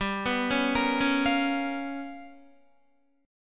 G 7th Sharp 9th Flat 5th, Guitar; G7#9b5 arpeggiated; JGuitar

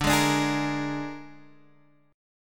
Bb 7th D, chord

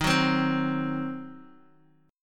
D sharp Minor 6th, Guitar DADGAD

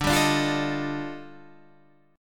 Eb Major Sharp 11th D

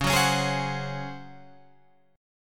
Db Major 7th Suspended 4th Db down Guitar Open F; strummed

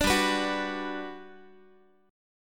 G Major 7th, Guitar; GM7 strummed; 17,15,14,16,x,x

